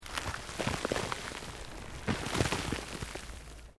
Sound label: EARTH LOOSE MOVE LONG, Debris; Material components for constructing more complex sounds; Materials